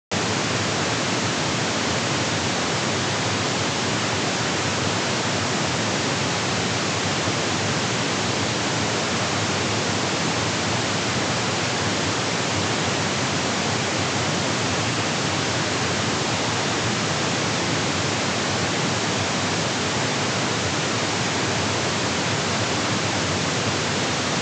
Stereo Setup and Imaging: Stereo balance; Surround Spectacular

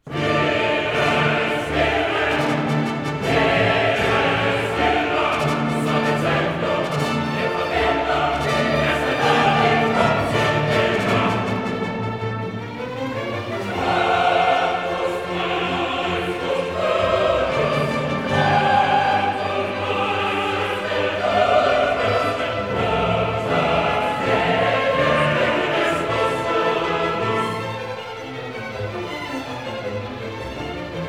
Requiem Mass in D minor, Dies irae; Mozart; K． 626; Sequentia．

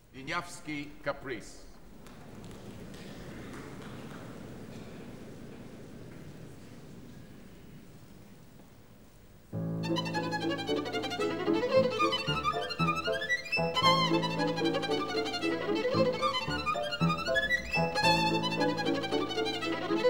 Caprice for violin & piano in A minor; Caprice for violin & piano in A minor (transcription of work by Wieniawski)

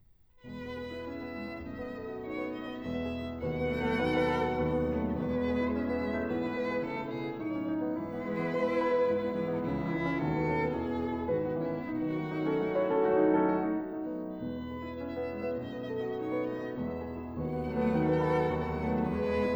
Piano Trio in A minor, Andante con moto

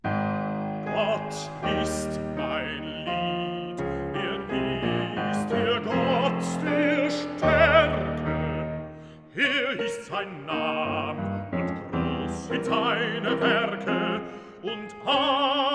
Songs for voice & piano; Gottes Macht und Vorsehung; Beethoven; Lieder

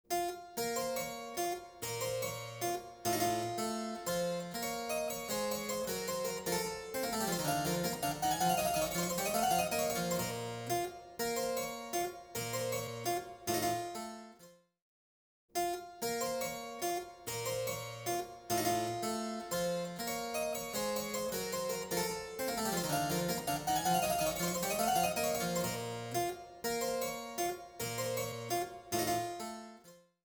Music (Stereo); Denon Audio Technical CD

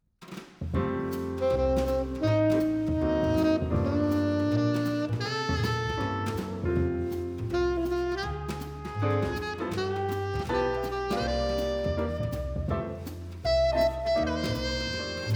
Sennheiser Mkh 80, microphone; Das Mikrofon, Vol. 2; The History of the Condenser Microphone

